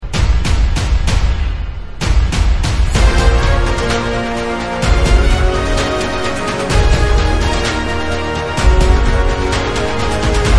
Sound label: Karaoke